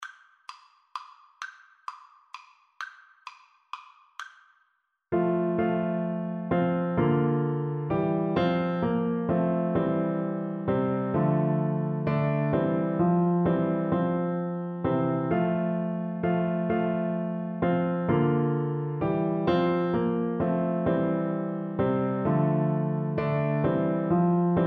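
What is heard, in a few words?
recorder karaoke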